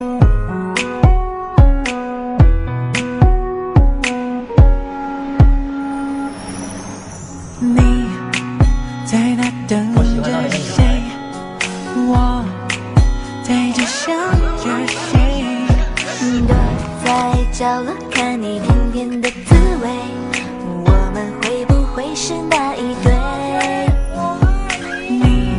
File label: japanese love ringtone